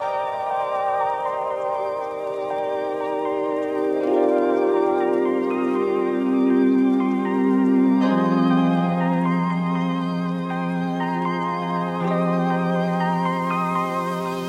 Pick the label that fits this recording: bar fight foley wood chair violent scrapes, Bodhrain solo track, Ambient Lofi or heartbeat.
Ambient Lofi